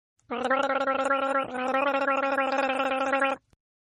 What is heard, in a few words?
Gargle; Gargling; Sound Effects:Voice